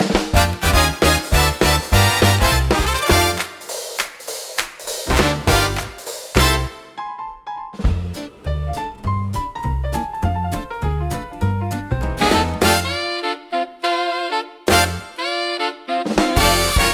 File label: Brass and Bubblegum, electro swing pop